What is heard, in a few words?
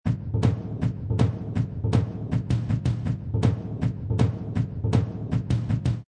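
Loop orchestral toms 160, Toms loop 160 bpm.; Drum Loops; Music Production Loops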